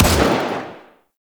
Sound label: Burst Rifle Fire; Grineer; AI; Weapons